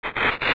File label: Appear; Leever